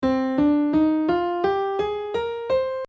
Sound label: c minor